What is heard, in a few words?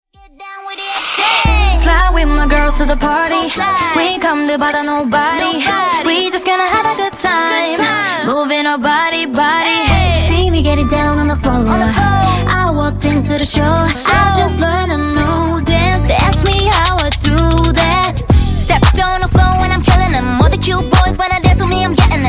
Epic Drop 114 bpm